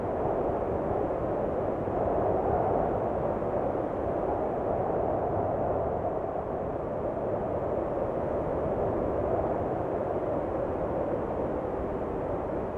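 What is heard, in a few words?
ambience sheltered; Pilot Down Behind Enemy Lines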